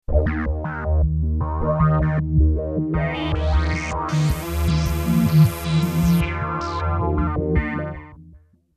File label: Vocoder